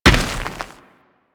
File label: Moa Step Dirt, footsteps; Enemies; Warframe